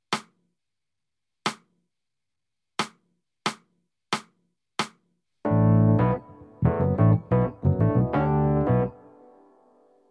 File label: Backing